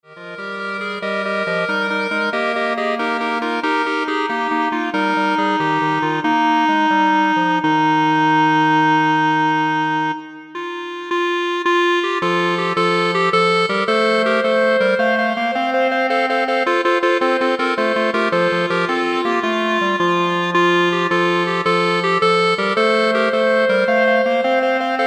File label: Clarinet Ensemble